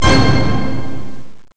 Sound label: orch hit